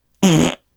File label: stinky fart prank